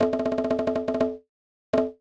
Djembe; Go-Rock Squad

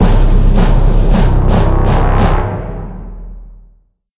Fanfare Drums Synth; Angry Birds

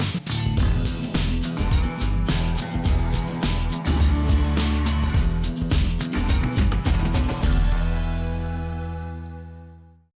Quest Assault Win

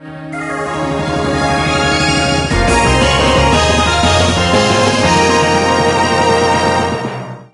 All Clear; hydra castle labyrinth gamerip 2011

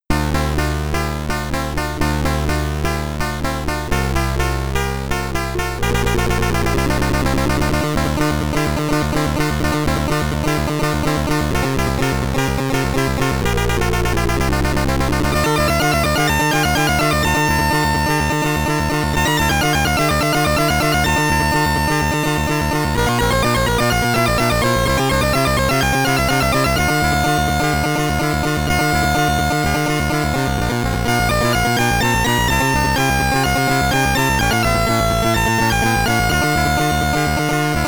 Stage SID Stereo; Gemini Wing; Commodore 64

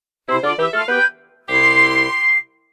Dungeon Cleared!

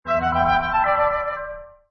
Lullaby; Yet Another Pixel Dungeon; Android

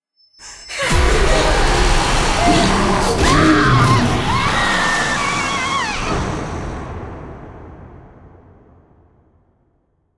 Attacked Stinger; silent hill homecoming 2008 gamerip pc ps3 x360; SFX Other Elle

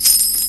Nano kristal

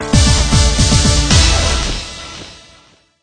Stinger Level Up; android

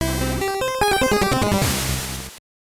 Jingle; Who Wants to Be a Millionaire 2nd Edition 2000 gbc

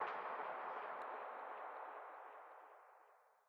Library Perc

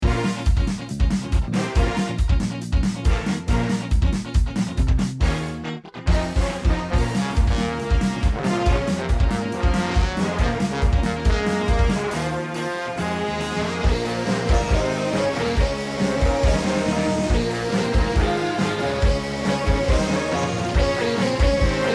Ska Battle; YIIK A Post Modern RPG

